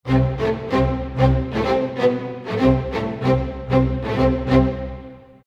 95 strings theme lo, Orchestral arrangements, 95 A min